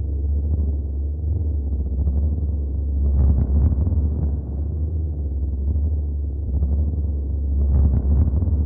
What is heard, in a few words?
LOW DRONE; ATMOS